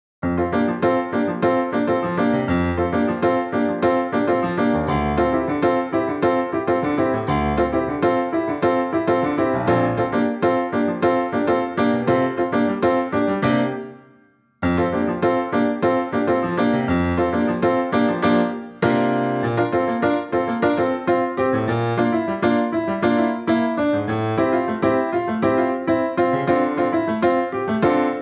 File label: 100bpm fm upbeat; Suno